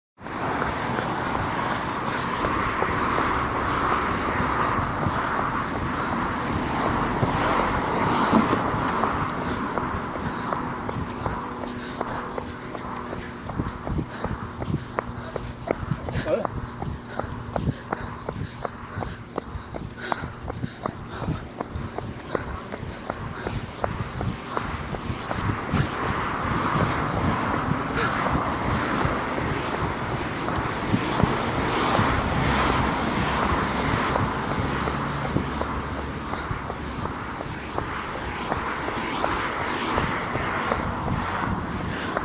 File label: park run